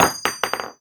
Shell heavy wood; STALKER; IWP

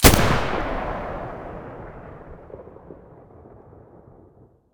muzzle g36 stereo, weapons

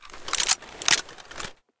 groza gl deactivate

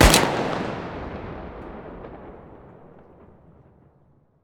groza muzzle mono distant, weapons

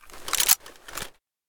groza gl deactivate scope

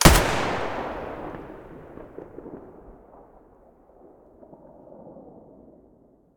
ak105 muzzle stereo, weapons; STALKER; IWP

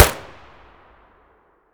akm muzzle distant, weapons; ak103; STALKER; IWP

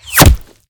bullet hit; STALKER; IWP

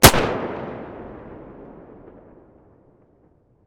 beretta muzzle mono distant, weapons

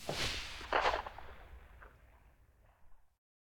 scar silencer mono very far

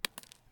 Shell 39mm dirt; STALKER; IWP

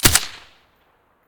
scar silencer stereo

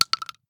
shell 12ga default; STALKER; IWP

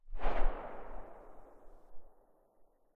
silencer very far, weapons; vpo101

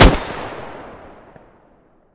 groza muzzle mono, weapons

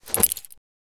grenade throw

